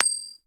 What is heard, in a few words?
coin toss